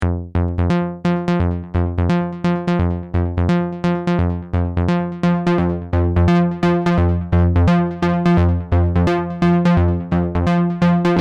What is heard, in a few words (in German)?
synthwerk kraftsequenz